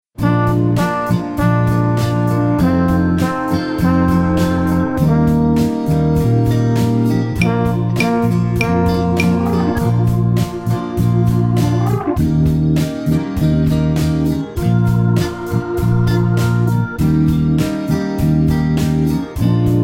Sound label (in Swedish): Klarinett rock; Klarinette